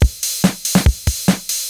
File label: 512 loop, MFB-512; Drum Samples